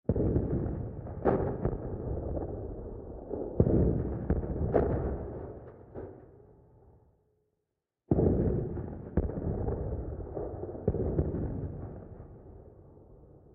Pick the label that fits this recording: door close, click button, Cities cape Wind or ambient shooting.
ambient shooting